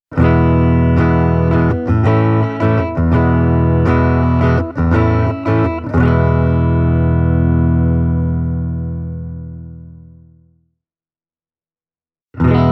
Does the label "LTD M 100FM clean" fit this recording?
Yes